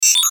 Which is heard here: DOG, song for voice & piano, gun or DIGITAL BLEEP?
DIGITAL BLEEP